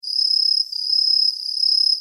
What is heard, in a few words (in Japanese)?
aomatsumushi; insects